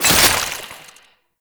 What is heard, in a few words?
Wrist Gun Alt Charge Stop; Weapons; Warframe; Tenno